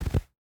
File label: footstep rubber boots snow jump land; Rust